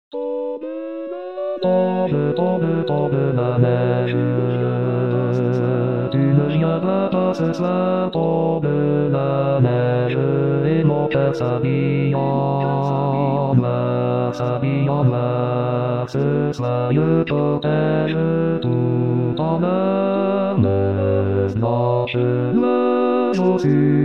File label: Bass; Variété